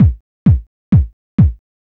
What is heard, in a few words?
130 kick loop, 4 on the floor loop; Kicks